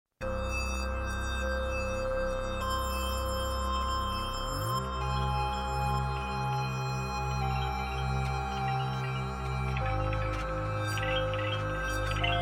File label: music